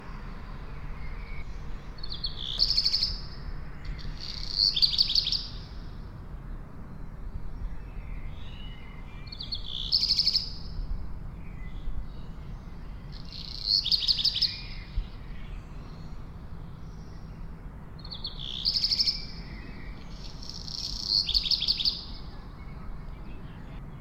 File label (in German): hausrotschwanz; Stefan Wehr